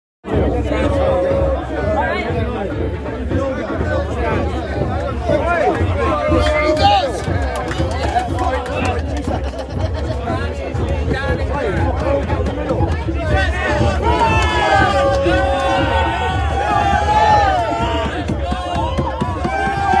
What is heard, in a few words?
Stonehenge 21 June; Summer solstice at sunrise, Stonehenge, Salisbury, England on 21st June 2024; thousands of people gathered there to wait for the sunrise